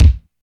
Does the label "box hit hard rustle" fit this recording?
No